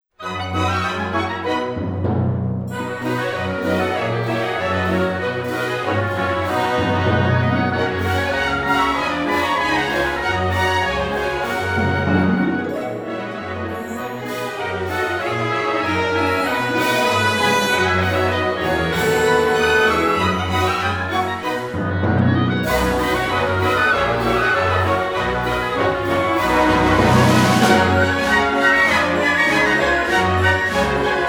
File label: Polka; Schwanda The Bagpiper